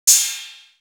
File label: Drum, Drum One Shots; AMG - Coldcut's Kleptomania VOL-1